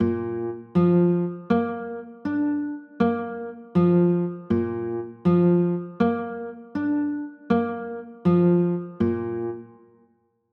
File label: a sharp maj